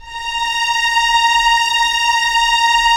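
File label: Strings Violins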